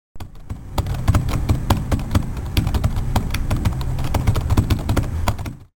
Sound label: powerbook